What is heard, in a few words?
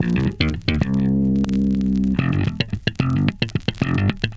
bass, Loops, Phonky